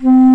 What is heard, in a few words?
BASS FLUTE C3, WIND